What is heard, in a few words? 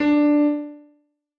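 kitten; mesecons noteblock d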